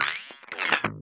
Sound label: BOING; Distored Reality